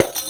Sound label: Tamborine Hit; Ambient Grooves